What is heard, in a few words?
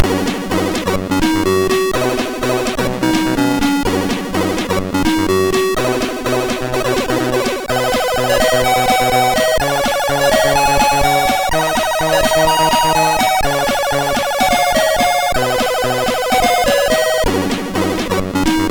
Music, MOS6581R3; hvsc